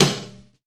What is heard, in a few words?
subtle reverb snare drum d key